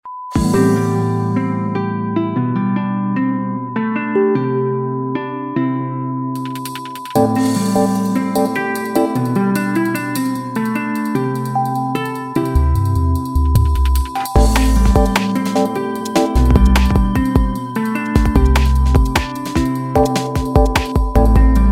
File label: air groove